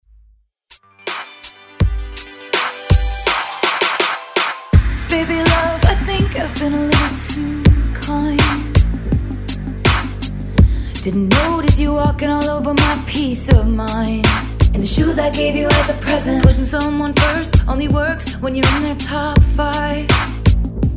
Top40; Redrum

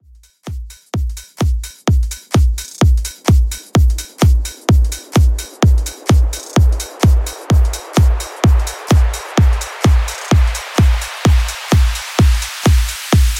Bigroom 128 Bpm

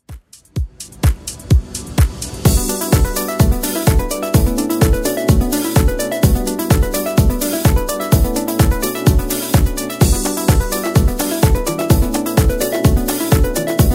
2Ks Redrum 127bpm

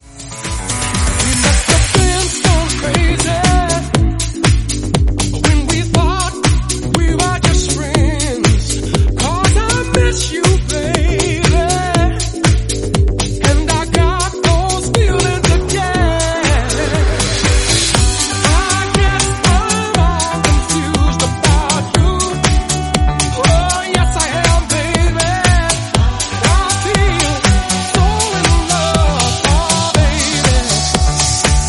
120bpm Bm

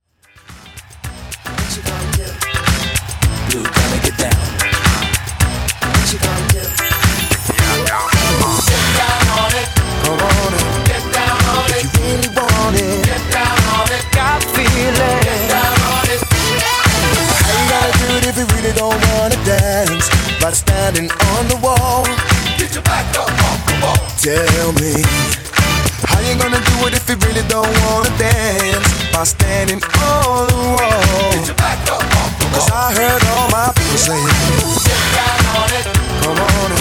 Redrum 110Bpm

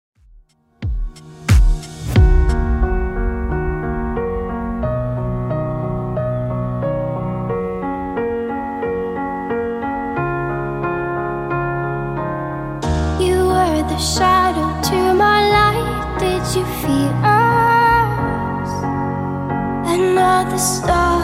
2ks Redrum 90bpm

